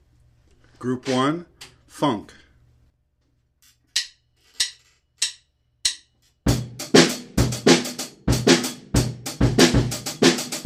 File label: funk